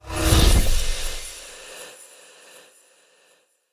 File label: Hallucination Smog Loop End; Bromius; Abilities